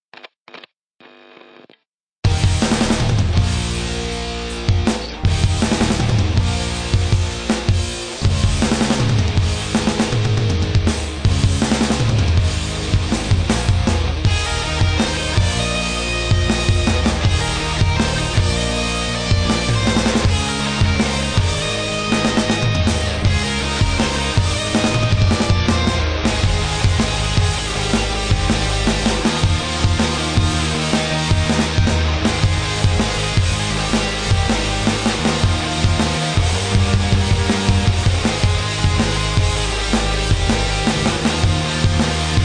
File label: medium Drums; battle of the bands